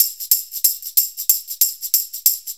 Shuffle Perc, Perc Loops; Sonic Foundry (Sony Creative Software); Drum Tools; Shuffles

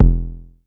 Bass bass drum, Jomox Airbase99, Drum Machines

